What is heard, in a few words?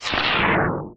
Retro Boom